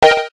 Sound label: digital, keytone; SKY IM-A600S Sirius